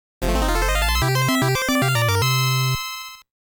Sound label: Start Signal; Seicross